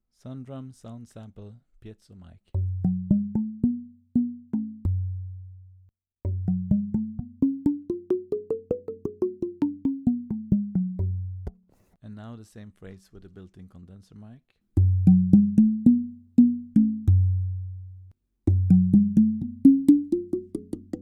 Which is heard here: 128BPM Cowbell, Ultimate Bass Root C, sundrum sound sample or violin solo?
sundrum sound sample